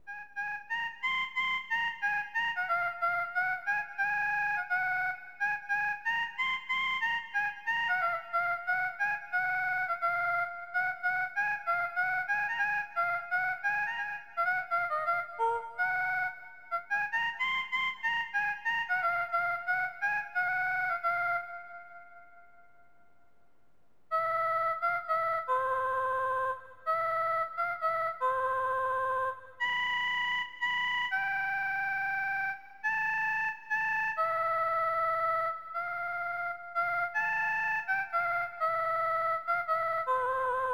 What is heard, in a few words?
pian sing; NiGHTS Into Dreams... (PS2) (gamerip) (2008)